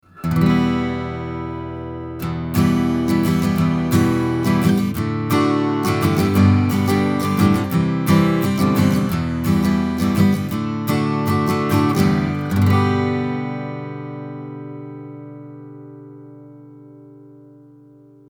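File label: Acoustic Guitar Strum 40cm; Aston Origin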